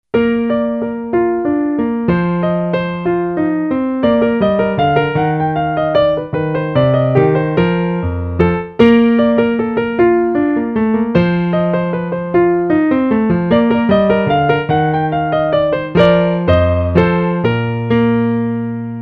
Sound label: piano menuet; менуэт; И. Геслер (1747-1822)